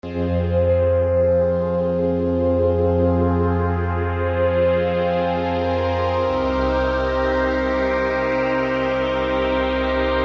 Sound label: strings; southpole